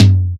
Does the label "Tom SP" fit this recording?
Yes